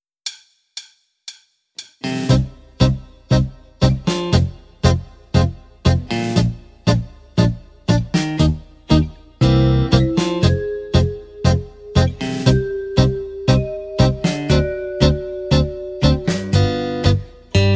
DANZARIN Tango BASE

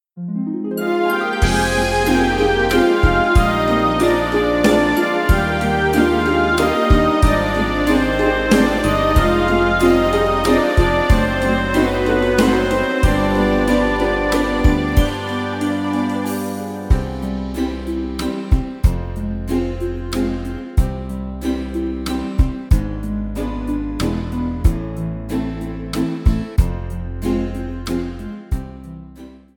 Valzer Lento, BASE

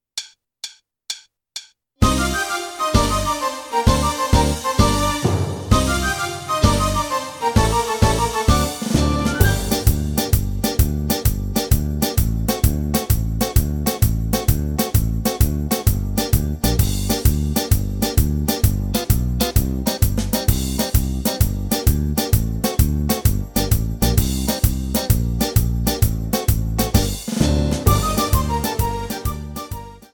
tarantella, base

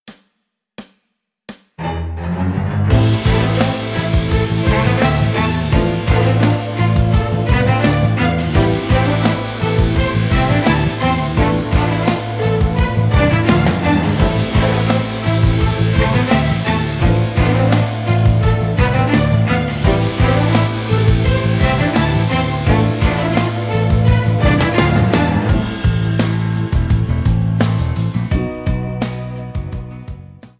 lento moderato, base

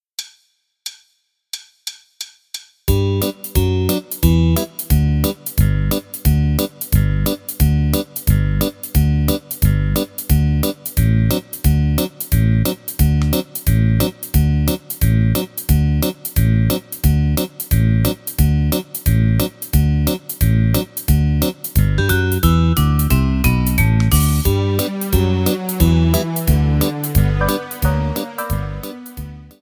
base, fox trot

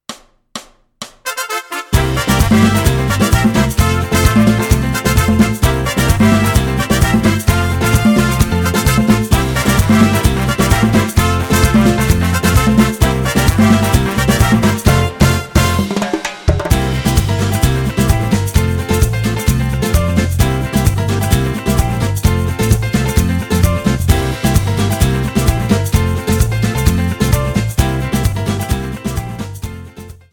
CIRINGUITO base, merengue

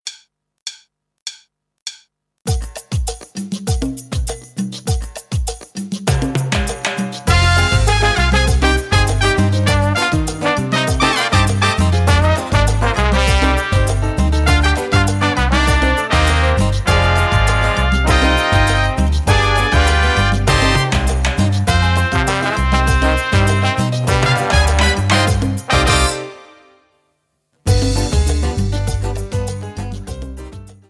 base, salsa

